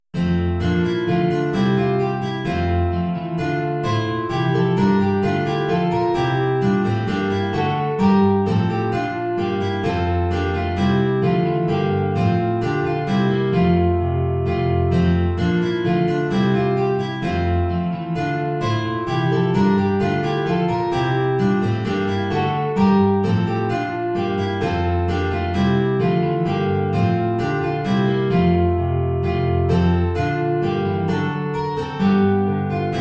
4 Gitarren; Ballet des feus

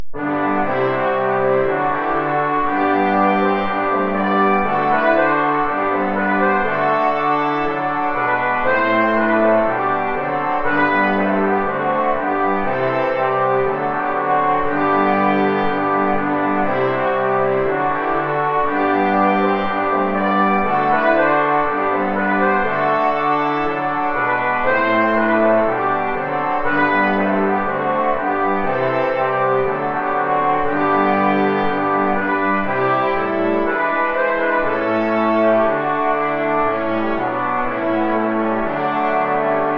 Bourree (2 Trompeten in C & 3 Posaunen)

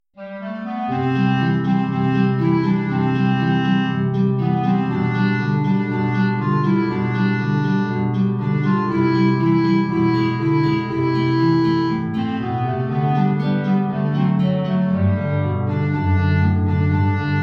Maestoso, Klarinette in Bb & Gitarre, tief

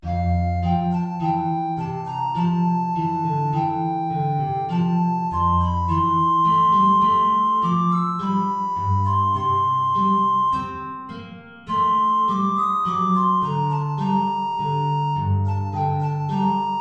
Sopranflöte Gitarre; Warum sollt ich mich denn grämen

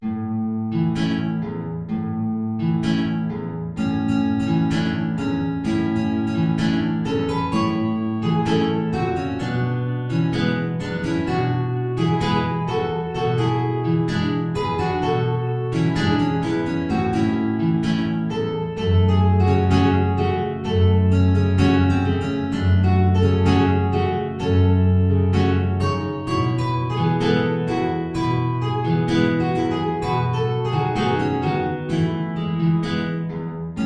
Tango (3 Gitarren)

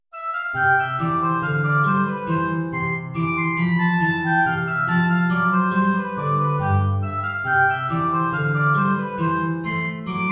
Hornpipe, Oboe & Gitarre

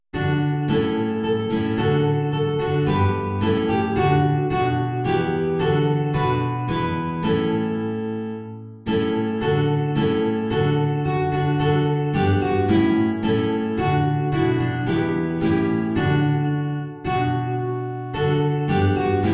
2 Gitarren; Lobt Gott, ihr Christen, alle gleich

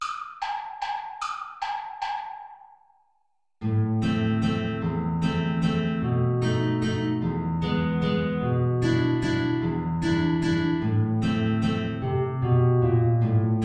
2 Querflöten & Gitarre, Guitar Play-along

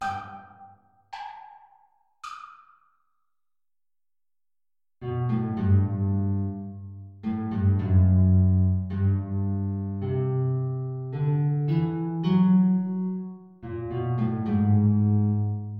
Andantino (Oboe & Gitarre) (Play-along)

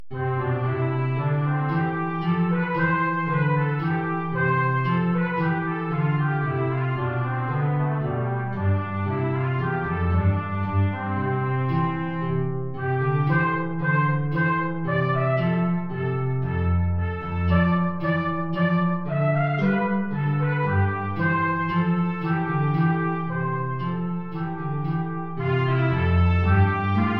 Air, Trompete in Bb & Gitarre, tief; BWV 212-24

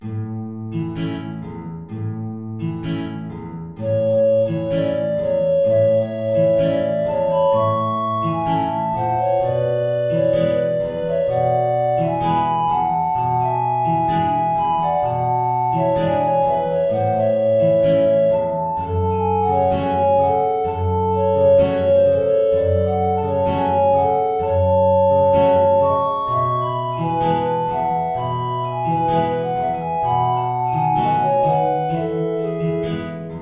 Tango 2 Blockflöten AT Gitarre tiefe Notierung

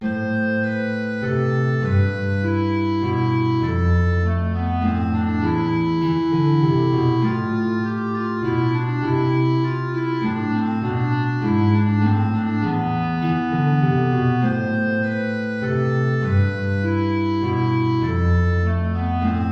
Menuet (Klarinette in Bb & Gitarre) (tief); BWV Anh 115

